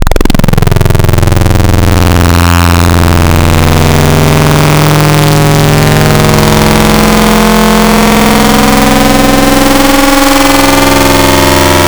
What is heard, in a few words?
Fm Vowel saw; Synthetic's Serum Collection; Cymatics - Nuclear for Xfer Serum Wavetables